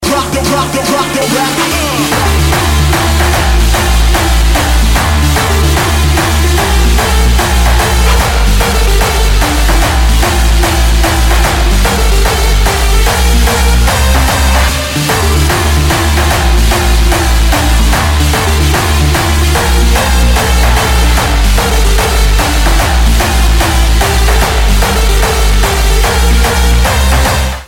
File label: Electric Trance